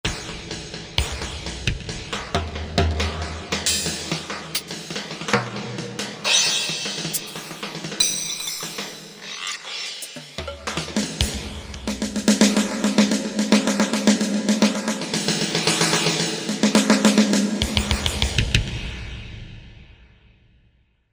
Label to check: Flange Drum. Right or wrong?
Right